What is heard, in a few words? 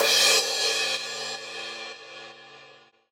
Crash; Equipped Music; Loungin House